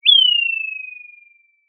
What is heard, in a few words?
Sound of an owl